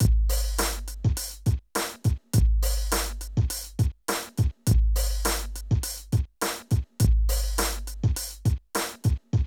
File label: Drum loops, Hip-hop; Zero-G Groove Construction (1993)